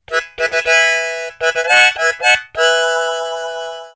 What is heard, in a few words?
Harmonica; Huawei Honor 3